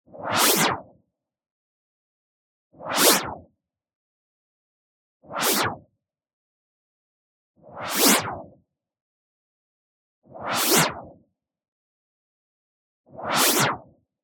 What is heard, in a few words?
whoosh, comb filter noise sweep; boom library; Anime Essentials